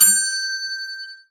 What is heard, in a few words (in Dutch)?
ping pedaal, seinhuis